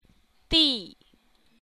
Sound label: tone